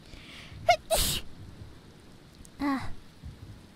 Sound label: Sneeze